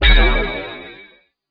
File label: Metal Impact